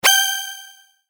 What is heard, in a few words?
sword